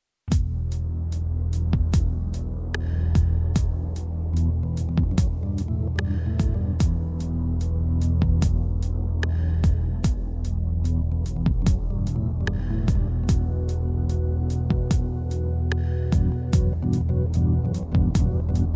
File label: Instrumental